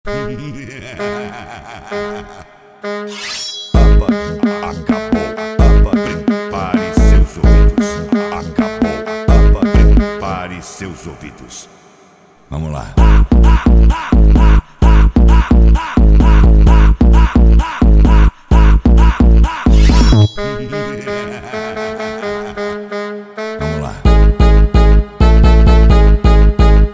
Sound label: Brazilian Phonk